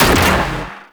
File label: Shotgun Fire 3D; CorpusShotgun